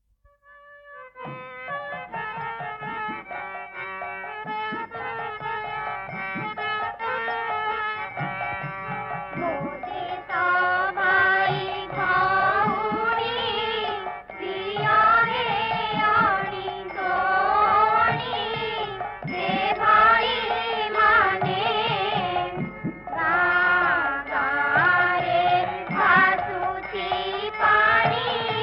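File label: Banya Sahajya Geet Chorus, Odia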